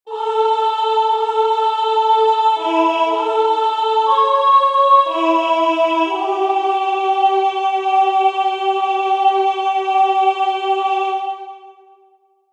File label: Surrender sop